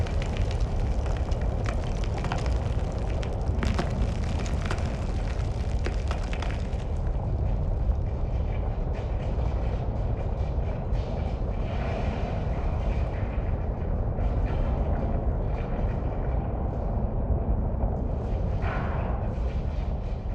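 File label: ambience interior Ashfight Quake Tunnel; Boomtown; Titanfall 2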